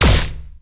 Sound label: laser gun; battle